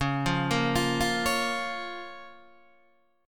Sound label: Db 6th, Guitar, DADGAD